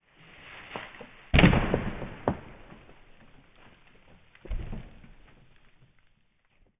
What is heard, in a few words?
big Rock Fall; falling